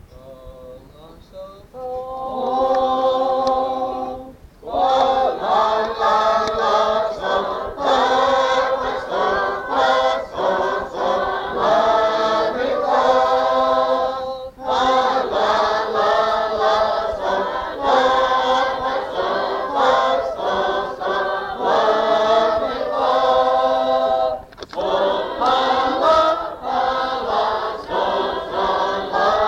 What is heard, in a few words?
Reese